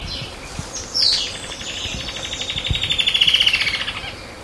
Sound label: dendroplex picus